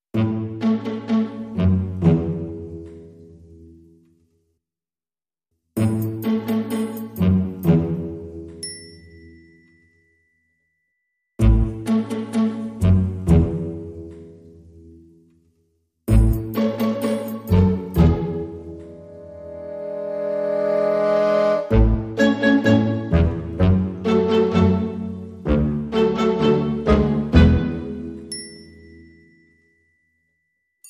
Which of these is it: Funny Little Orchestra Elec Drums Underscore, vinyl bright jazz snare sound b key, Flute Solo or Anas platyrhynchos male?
Funny Little Orchestra Elec Drums Underscore